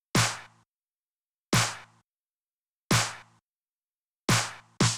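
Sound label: Music Maker Snare; barbie dreamhouse adventures; Snare 4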